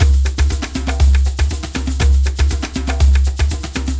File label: DJMB DNC, Heart of Africa; Spectrasonics; Heart of Africa 2